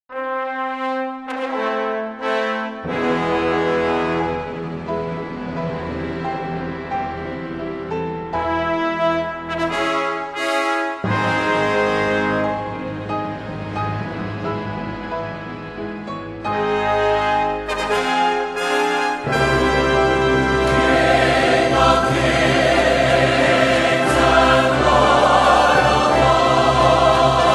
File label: Chorale With Orchestra, Crown Him With Many Crowns